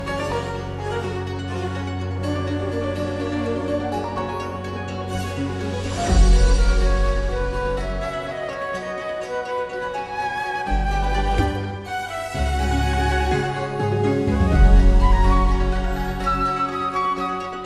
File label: mood loop whimsical; spirit blossom